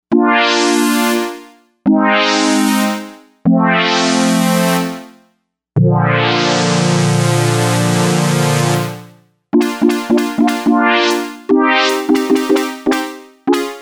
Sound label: Novation UltraNova; Factory Patches